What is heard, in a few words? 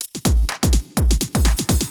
DRUMLOOP, House, Loop; eJay